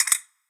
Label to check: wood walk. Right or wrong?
Wrong